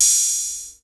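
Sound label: Open Hi Hat; Roland MV8800; TR-808MKS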